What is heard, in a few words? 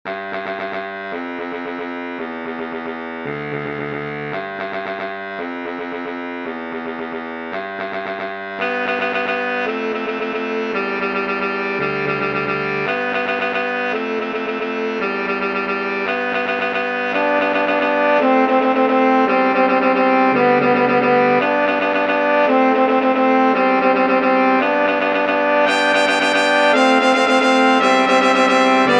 horns